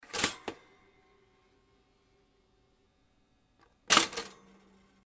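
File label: toster vkl vykl, toaster on off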